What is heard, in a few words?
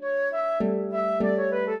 minuet, flute harp; Mozart